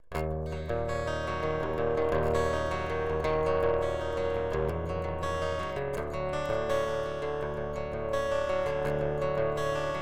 picked acoustic

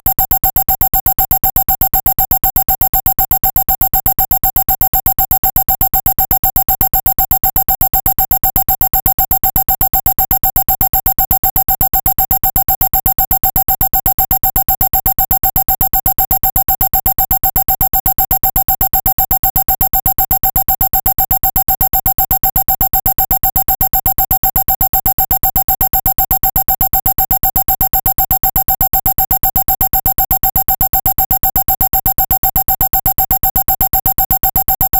Alarm sound

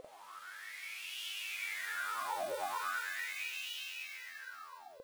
wah change in source velocity

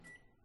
Pulse Rate

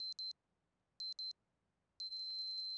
end of round

